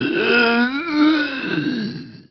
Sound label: zombie attack, male